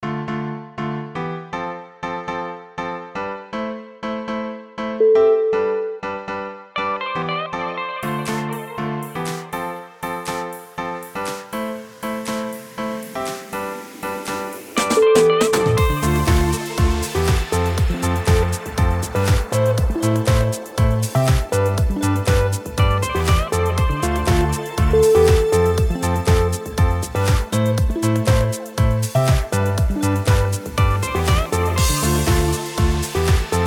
summer electro pop

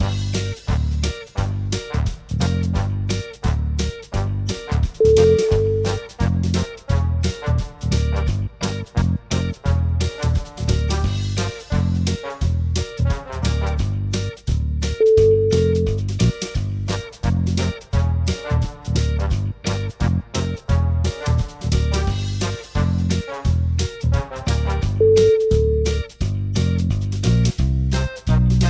amusing comedy

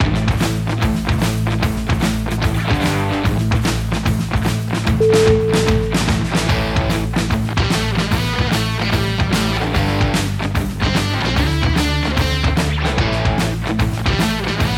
white keys

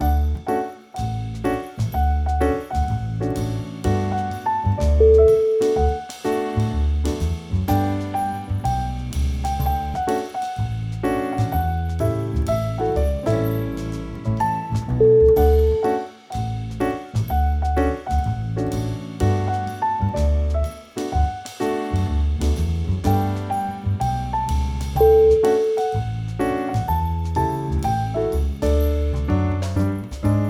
xmas jazz trio

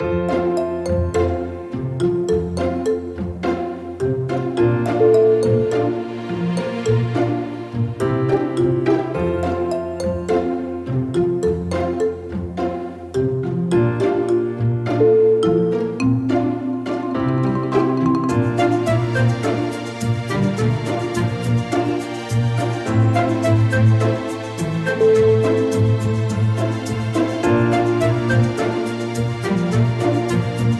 children cartoon

